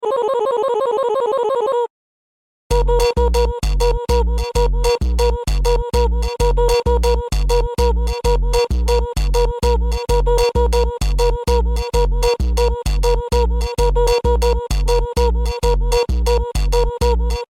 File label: BOOP FUNK; SOMBRA